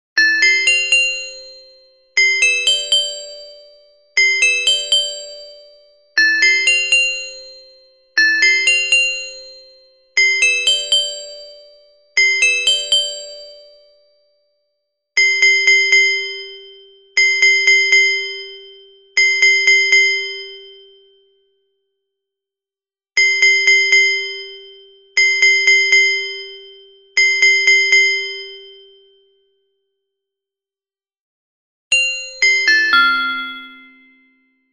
CARILLON SOPRANO

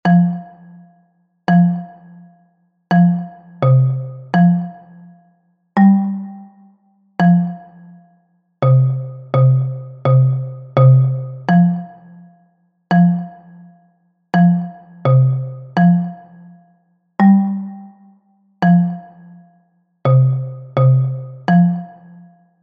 Xilofono Baixo; Bo Nadal

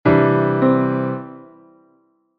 apoiatura

